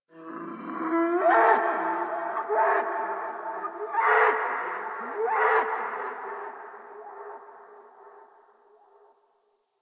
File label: Distant Creature; Ambience; One Offs; Warframe; Eidolon Plains